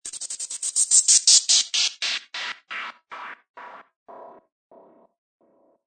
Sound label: Downlifter; Zenhiser